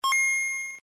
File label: Nintendo Gameboy